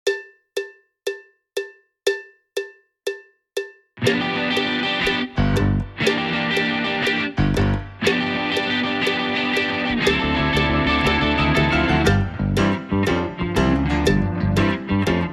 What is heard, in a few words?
Shuffle minus drums